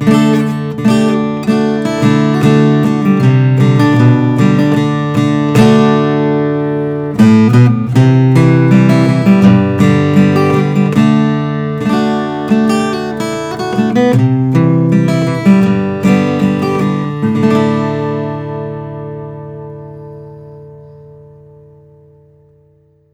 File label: martin custom shop 00 12 fret short scale